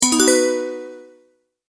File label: multimedia tone advance